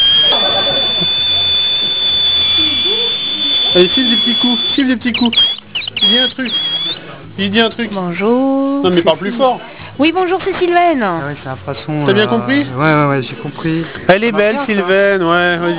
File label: cri; anniversaire; écoutez nous crier !